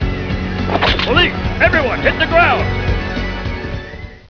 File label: police